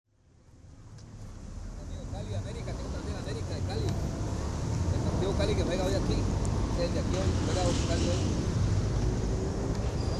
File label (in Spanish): Paisaje Estadio